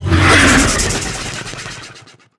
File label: idle out, barnacle; Half Life Alyx